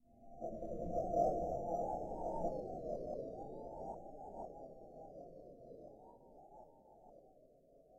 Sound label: Killing Plant Loop long